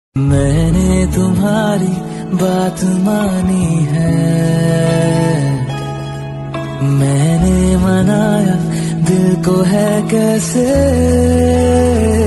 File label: Hindi Sad Song Ringtone